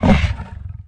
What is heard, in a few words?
Hippogryph pissed